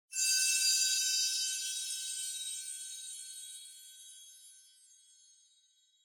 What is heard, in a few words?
Onoma Sparkle Low; オノマトペ キラキラ05-1(低)